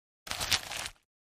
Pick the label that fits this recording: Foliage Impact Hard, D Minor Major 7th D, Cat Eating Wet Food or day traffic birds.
Foliage Impact Hard